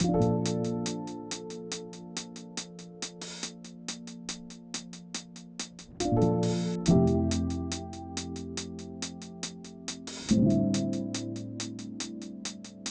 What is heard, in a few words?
Lo-Fi Wash Over